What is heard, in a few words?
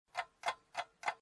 timer sfx; jetpackLearningPlatform; match-the-word